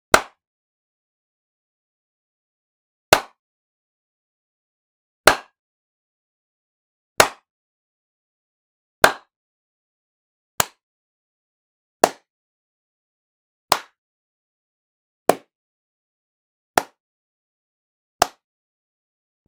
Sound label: slaps punches claps; human; NS Studios - ultra sound collection